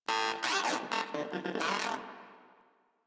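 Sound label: Moa Aggressive Vocal Bored Lets Go; Warframe; Pets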